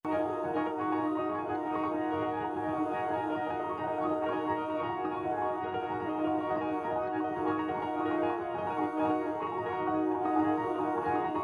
Eb granular piano and vocal; pad

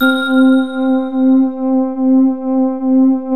DIGI BLEND, Emu Classic Synths; E-MU Formula 4000 Series Vol. 3; Analog Odyssey

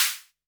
hihat, Novation Bass Station 2

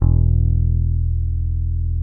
P BASS SUSTN MP E1; Sampleheads; Will Lee Bass Library

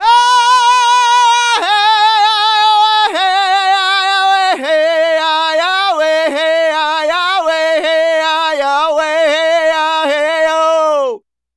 Native Vocals, Native American; Continental Drift Aftershocks

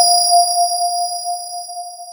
VS BELLS, KORG O1/W; Rubber Chicken Software Sample Bank

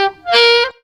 C sax; Zero-G; Funky Elements

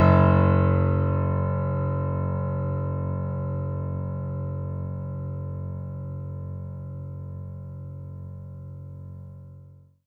F#1, Synth Line

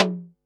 snaredrum, Pearl SC-40

